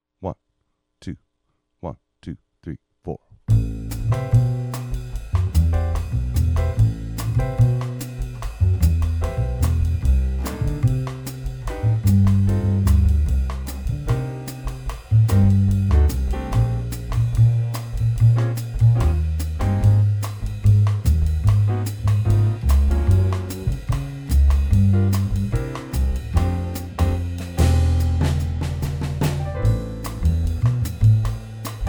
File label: latin rhythm section guitar muted